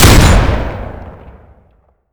shotty lmg; garrysmod

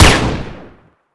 type frog; garrysmod

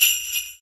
sleigh; garrysmod

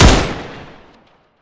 vhs; garrysmod

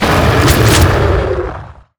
m3 draw; garrysmod

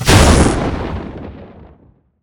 arc start; garrysmod

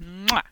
kiss; garrysmod

